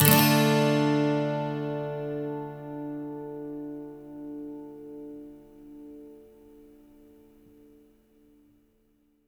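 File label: 12 string minor, Acoustic Guitar Loops; USB Soundscan vol.04; AKAI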